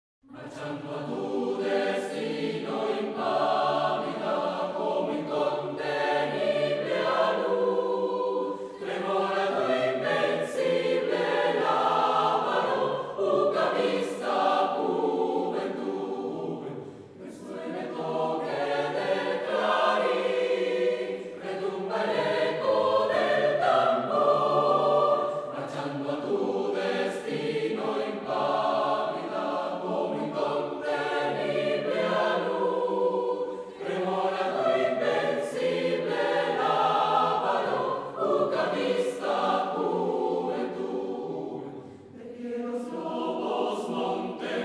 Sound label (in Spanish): marcha; ucabista